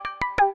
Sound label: alert